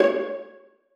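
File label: violas pizzicato c5; Sonatina Symphonic Orchestra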